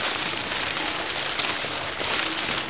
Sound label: grass roll, vehicles